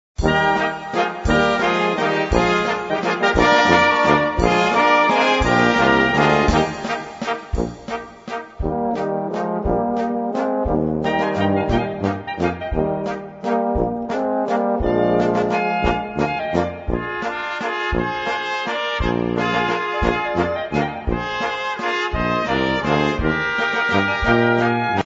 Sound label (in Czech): VALCIK